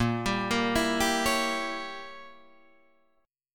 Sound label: Bb Diminished 7th Bb Guitar Drop D; arpeggiated; JGuitar